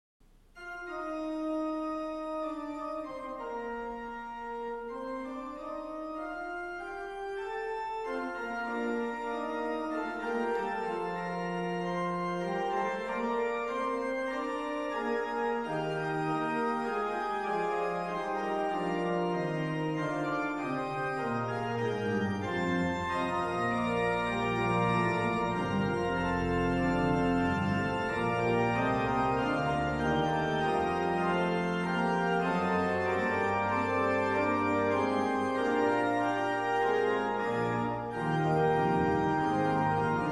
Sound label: Fugue E-flat, organ